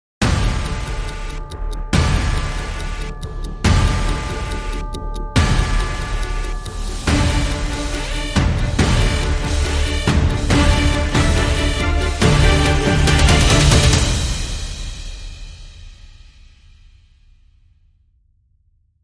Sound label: Dramatic Sting